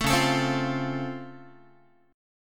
C sharp Augmented Major 7th C sharp down Guitar, chord; 12,9,12,10,9,x; strummed; JGuitar